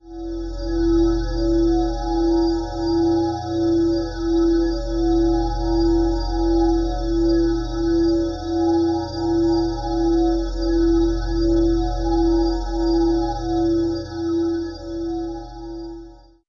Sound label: bell texture; Pads